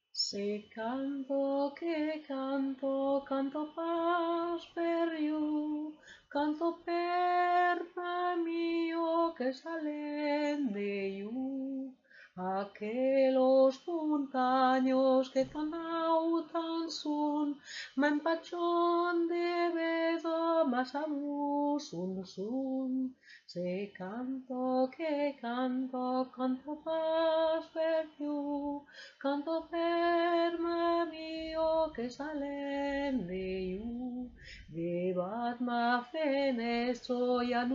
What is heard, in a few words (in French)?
Hymne occitan; SE CANTO